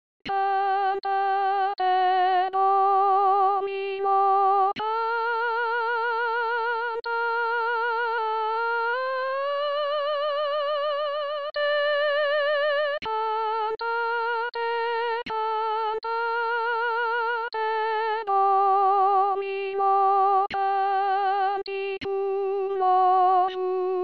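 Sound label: soprano; Pitoni; Cantate Domino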